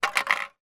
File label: empty drinks can roll or drop on street; foley